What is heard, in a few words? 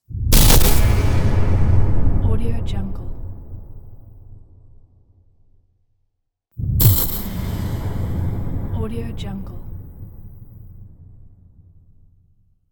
horror electric crash